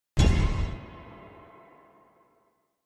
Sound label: Dun